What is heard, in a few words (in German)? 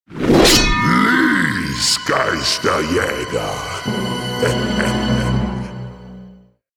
handy benachrichtigungston